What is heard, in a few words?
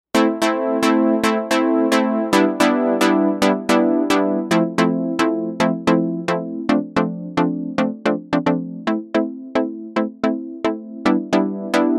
Trance Plk